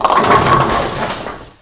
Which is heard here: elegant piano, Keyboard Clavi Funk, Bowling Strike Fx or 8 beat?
Bowling Strike Fx